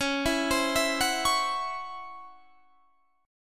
C sharp Minor Major 7th Double Flat 5th Guitar Standard; C#mM7bb5; arpeggiated; JGuitar